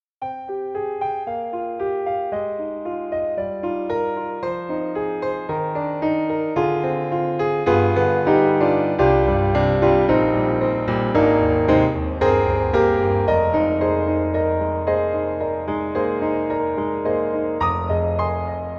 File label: Mini Grand Ballad In A Hall; AIR Music Technology